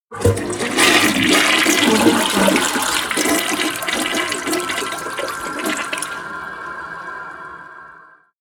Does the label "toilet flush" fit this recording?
Yes